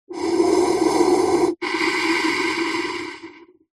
human breathing firefighter mask pov single inhale exhale